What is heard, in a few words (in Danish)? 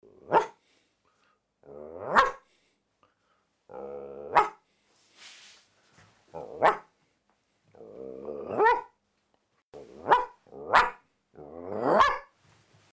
Hund